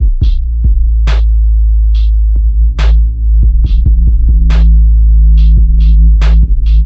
140 bpm, insanely overloaded kick snare trap, drum loops and breaks; 131 to 140 bpm